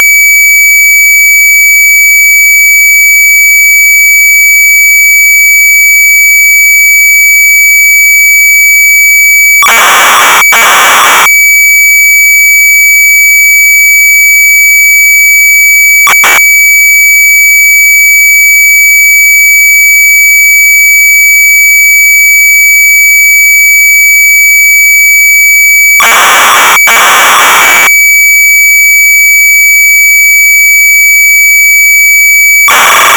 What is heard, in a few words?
MORSE